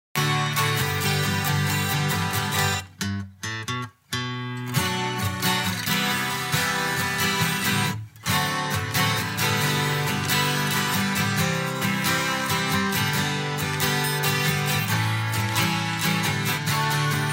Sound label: guitar short karaoke